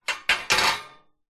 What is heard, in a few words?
metal frame loose set down clatter; zapsplat